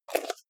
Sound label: jar plastic midsize close fast